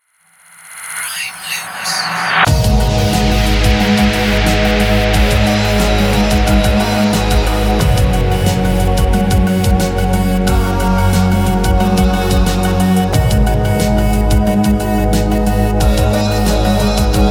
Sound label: Chill Synths